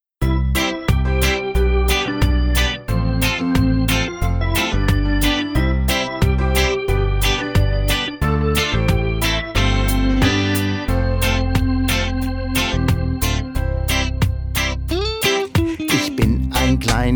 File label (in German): Song